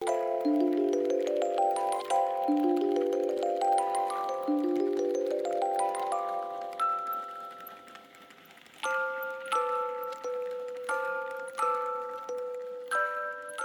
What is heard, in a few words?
music box